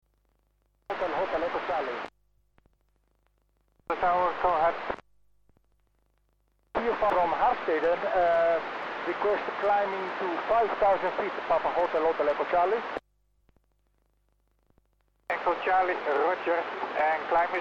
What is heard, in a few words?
hot air balloon ballon fiesta